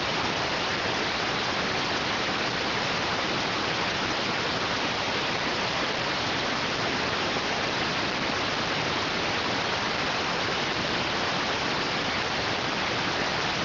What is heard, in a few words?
rtty rx